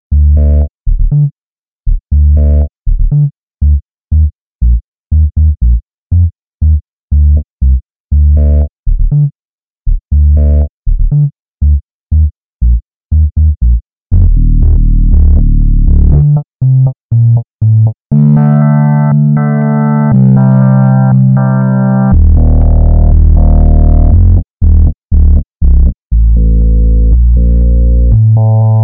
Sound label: bass roar